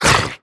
grunt soft, creatures; vox

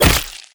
attack hit, zombie; creatures

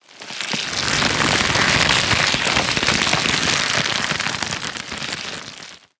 tongue ext, barnacle; Half Life Alyx

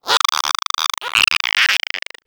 egg fling; player; Portal 2; eggbot

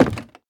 wood, footsteps

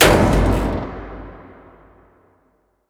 stomp sheet metal, antlion step

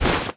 move soft; scripted sequences, a1, safehouse

